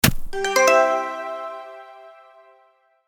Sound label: checkpoint; Modal Verbs; grammar games